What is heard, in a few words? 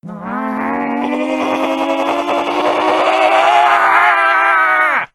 zombie rage